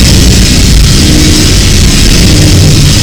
car blast; racecar

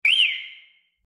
whistle; dutch; taunts

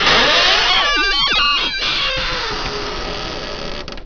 creak door; valve; nite2

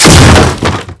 wood panel break; garrysmod; prop hunt

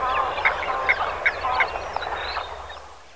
Bird, animals; valve; ambient

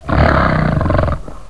die; valve

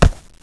tile; valve

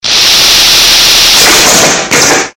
epic shock; garrysmod; prop hunt

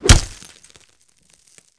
hit

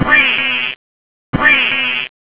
quack quack; valve

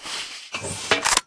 rpg load; valve

